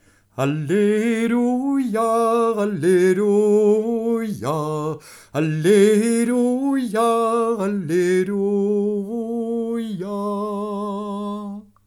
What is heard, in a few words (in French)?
vigile ste famille alleluia